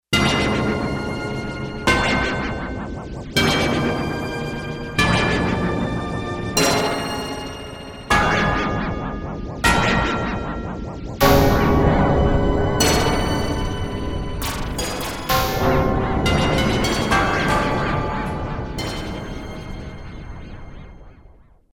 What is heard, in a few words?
FX Menu